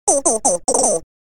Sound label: EEEE